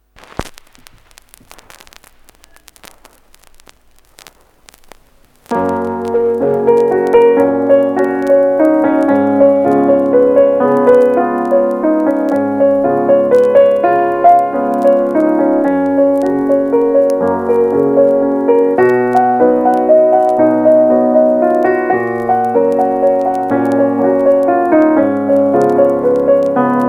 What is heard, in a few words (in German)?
Walzer; Kupelwieser-Walzer; Version Richard Strauss; Isolde Ahlgrimm; Deutsche Grammophon